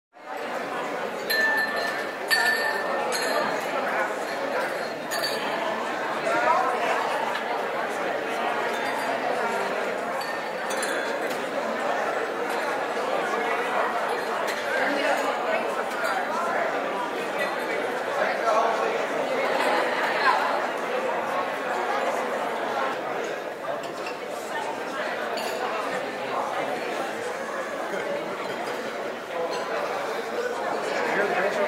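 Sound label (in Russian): звук в столовой за обедом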